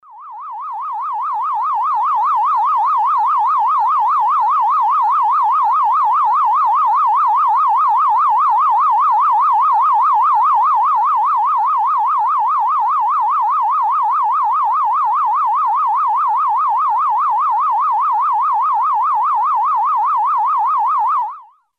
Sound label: Wail Siren